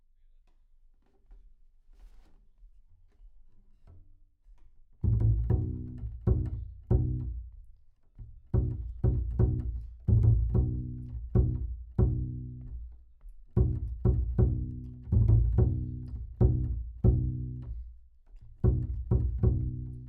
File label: Bass